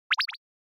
Anime Motion Dry

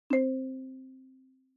Xylophone Multi

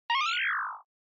Motion Slide Slow High

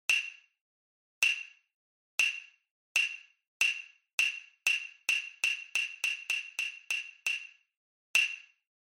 Hyoshigi

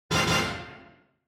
Quiz Question High; クイズ 出題

